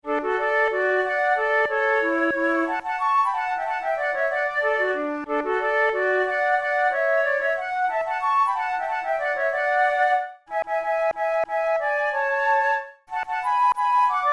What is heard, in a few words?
duet, flute